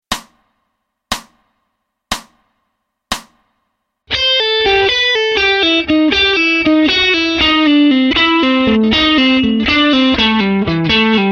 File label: A Dorian Line (SLOW)